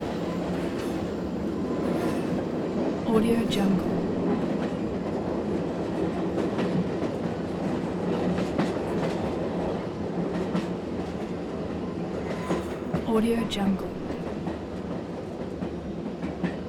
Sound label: passenger train canada 1950s sleeper